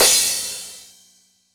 TR-909 Crash; Roland BK-5

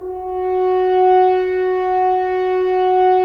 Solo F.Horn, Roland LA Composer

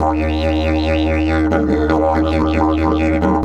140, DIDGE RIFFS

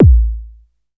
kick, Novation Bass Station 2

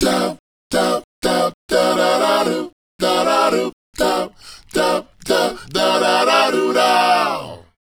Male Group, Hip-Hop and RnB Vocals

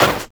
SD, Drum & Bass, 160 BPM

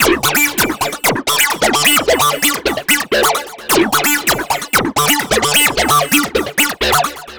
NRG Arp, trance

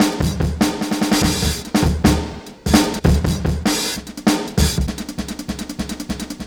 Break Beat; Cinematic Funk Urban Tone Poems; Sonic Foundry (Sony Creative Software)

